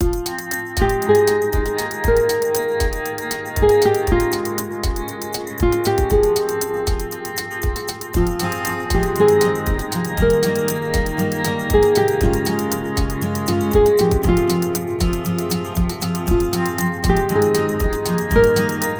no cello

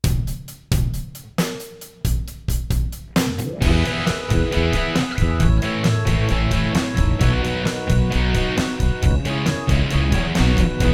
Rockin Pop (added keys)

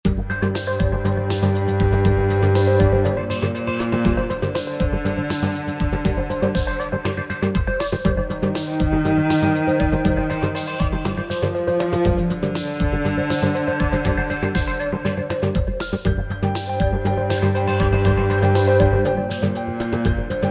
Cello Noir

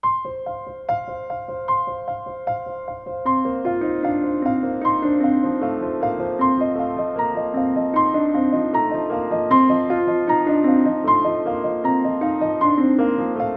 Acoustic, piano

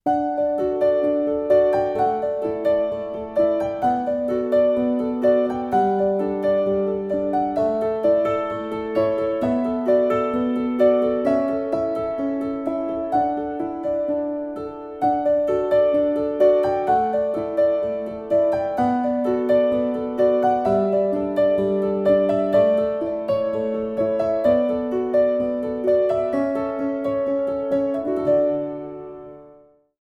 no cello

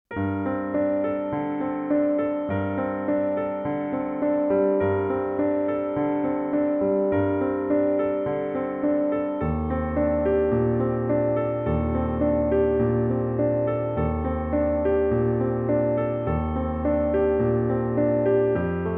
solo piano

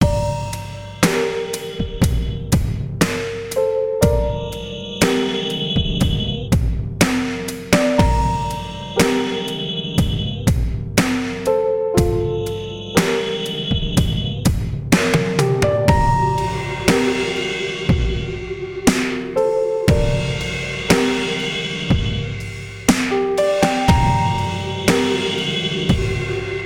Haunted Groove

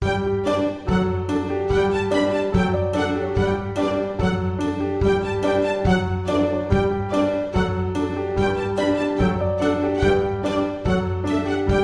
Bouncey Bounce

